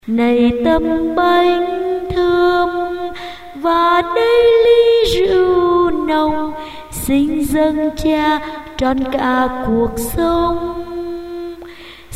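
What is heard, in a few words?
soprano tenor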